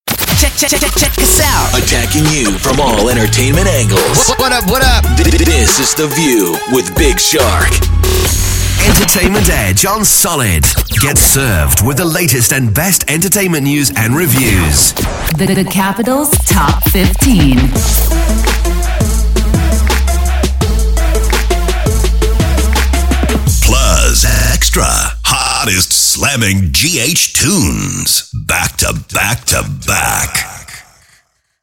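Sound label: ENTERTAINMENT SHOW JINGLES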